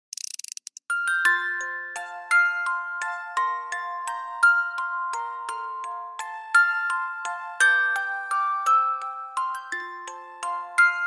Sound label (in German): Meine kleine Spieluhr Instrumental